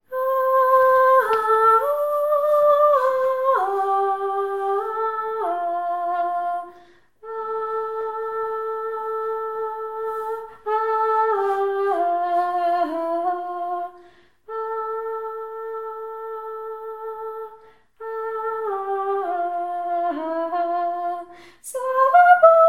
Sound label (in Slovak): slavabohu sopran; spevokol